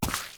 boots mud; Apotheorasis

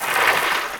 Ski Turn On Rock; Misc Sports

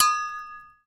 Hit Glass Jar Clink; SFX Kit; Foley - Misc

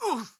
town human road hit; Blind Drive

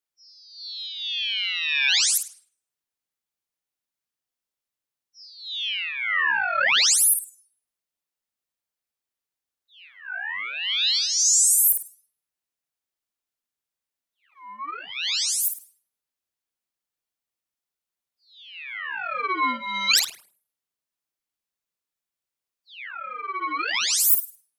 tonal, rising freq shifter; boom library; Anime Essentials

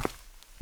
concrete; blindside

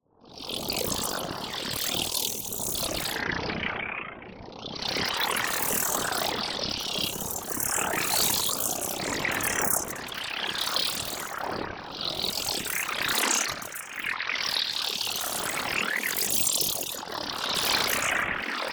MOVEMENT Grain Storm; NEON; boom library

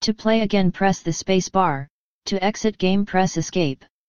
game over; DeadFright

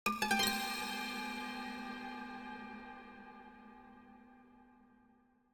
String Pluck Chord Strike With Reverb; Hollywood Edge; sound designer's toolkit v2

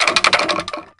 Bone Tower Percussion Rattle; SFX Kit; Foley - Percussion

